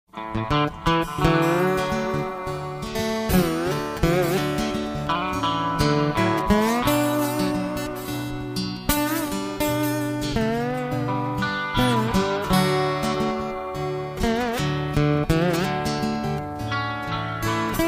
Irish Bird Song

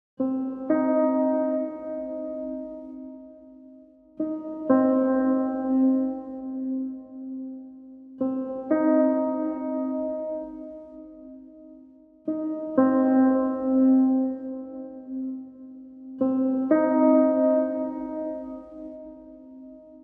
Pianos

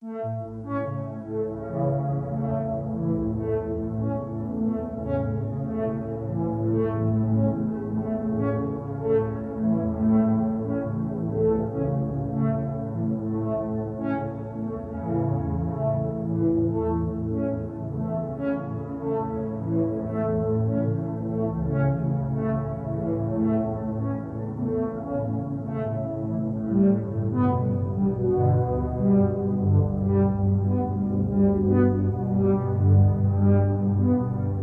Bass

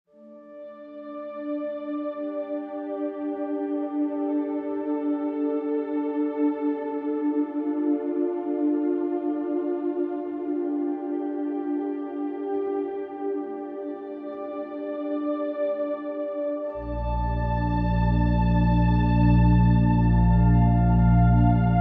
Soft

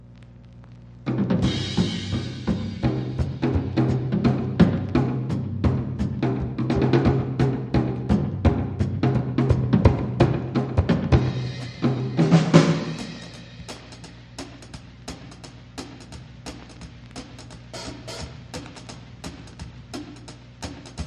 Drums

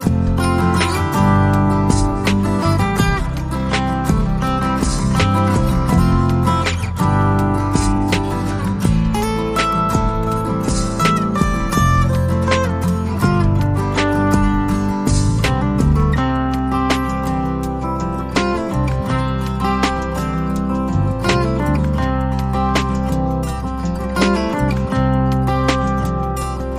Room For Chill No Kalimba

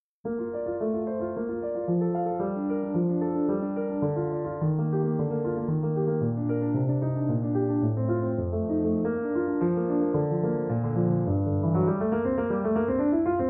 Prelude In Bb Major; BWV 866 I Prelude; Johann Sebastian Bach (PD)

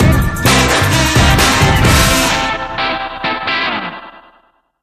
Twist Stinger

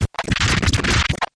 Hard Static

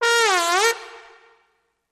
Trombone Gliss Down and Up

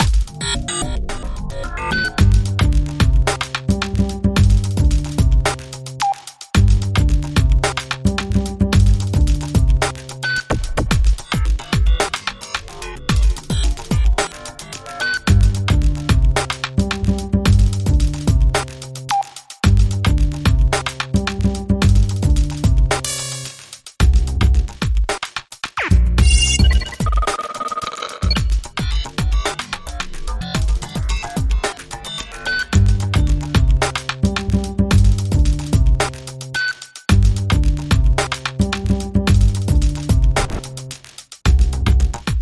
Soundscape

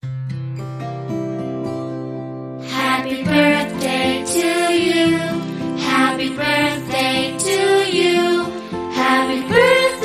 Children Vocal Happy Birthday

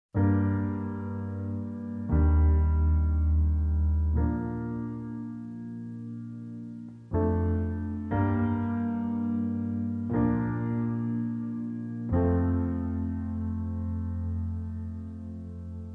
Crashing Waves, Rhythm

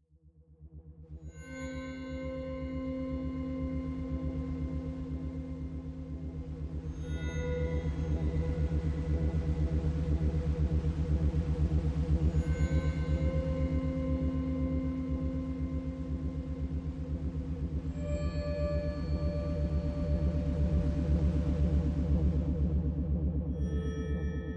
Ghosted Strings and FX

